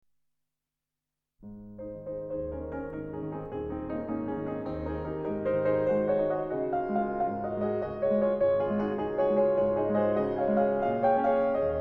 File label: 3 Nouvelles Études, Nr. 2 As-Dur, The Piano Works; Chopin